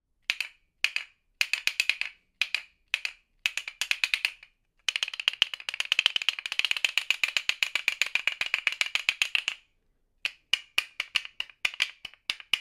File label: Castanets